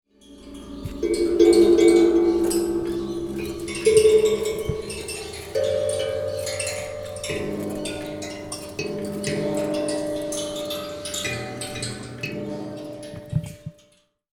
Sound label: Metal bowl